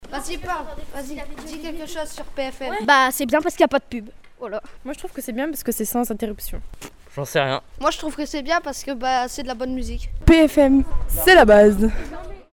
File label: jingle